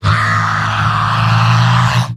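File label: pain critical death, male; heavy; tf2; garrysmod; mvm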